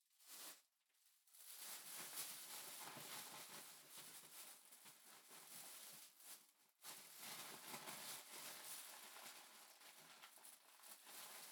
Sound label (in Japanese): 買い物袋 を ガサゴソ; KU100